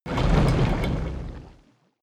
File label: game navy ship select, Signale